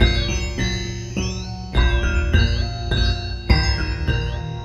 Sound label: Oriental Delay; Sonic Foundry (Sony Creative Software); Ma Ja Le Ethereal Textures